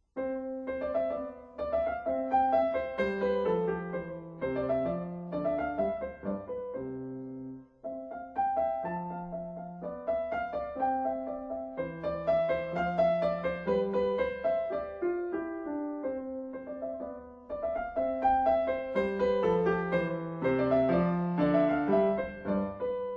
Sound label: Sonatina in C